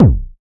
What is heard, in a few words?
boom bass drum sample b key